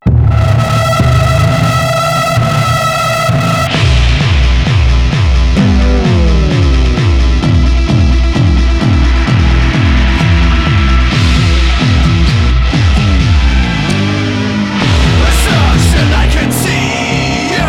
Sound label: telepathetic trance